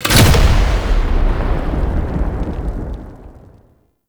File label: Deployment Cannon Loading Card Loop Start; Warframe; Hub; Tau